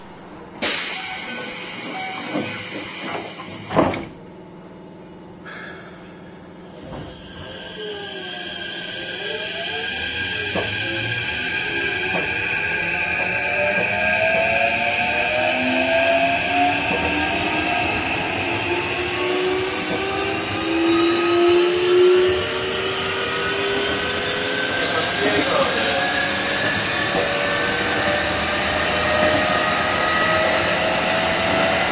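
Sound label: variable-voltage variable-frequency inverter, Mitsubishi; keikyu 1654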